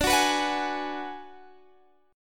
G sharp Minor 7th Flat 5th D

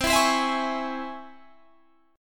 Db Minor Major 7th C

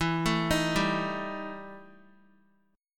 E Minor Major 7th Double Flat 5th, Guitar; arpeggiated; JGuitar

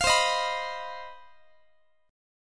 C Minor Major 7th Double Flat 5th chord, Guitar; CmM7bb5; strummed

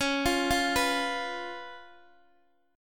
Db 7th Db down Guitar DADGAD

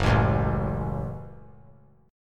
Gb Minor Gb; strummed; JGuitar

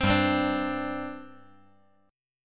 G Suspended 4th

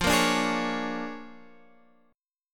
Bb 7th Sharp 9th F

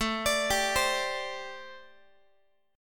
A Minor 11th, Guitar; Am11; arpeggiated; chordshape

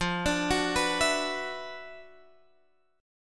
D 6th Add 9th F, Guitar, Standard; D6add9/F arpeggiated; 13,17,16,16,17,x; JGuitar

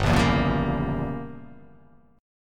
G Diminished 7th

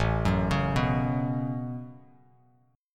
A 6th Add 9th A down Bass Standard; A6add9; arpeggiated

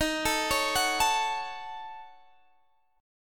Eb Minor 7th Flat 5th Eb down Guitar Standard; arpeggiated; chord; JGuitar

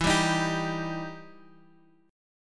E Minor Major 7th E down Guitar Drop D

